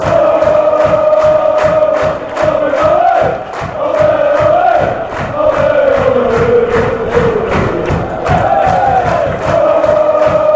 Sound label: chant, fanchants; feyenoord